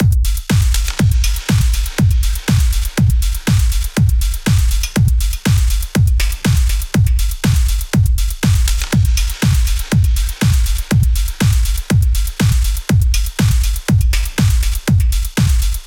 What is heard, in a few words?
Drumloop WET; United Plugins; Dropkick; Trim a zero